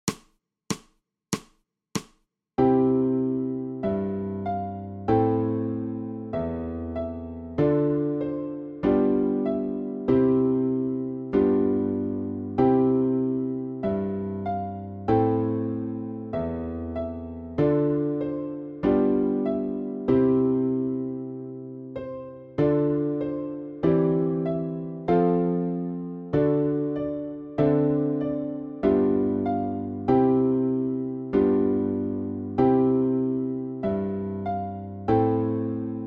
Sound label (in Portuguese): PLAYBACK ALTO; Hansel e Gretel